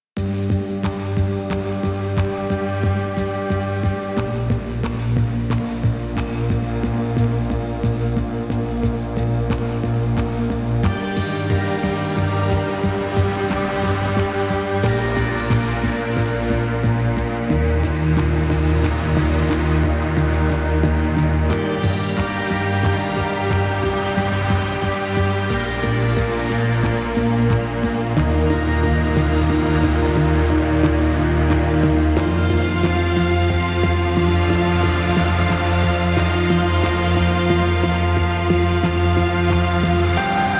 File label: BG Music; Warframe; DE; Night Wave Radio; Nora Night Episodes